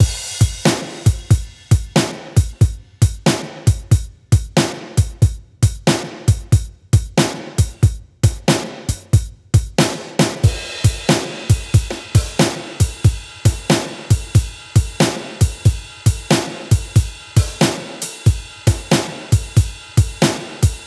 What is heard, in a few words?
Blues Drums Strong Rock 92